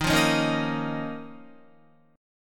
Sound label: Eb 7th Suspended 2nd, Guitar; 11,8,11,8,10,10; 4E,4A,5D,5G,5C,6F; strummed